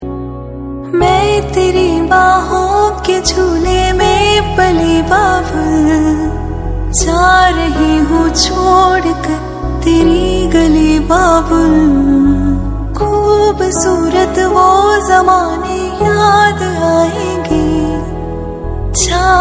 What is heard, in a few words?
Kanyadan song